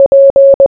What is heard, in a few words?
Morse Code P